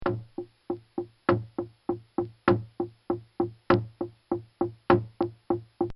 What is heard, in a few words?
Navajo War Drum; Drums; Musical; Bobby Cole